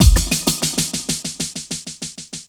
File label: Dub Drum; Sonic Foundry (Sony Creative Software); Ambient Grooves; Dub Hits